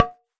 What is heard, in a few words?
Polystyrene Knock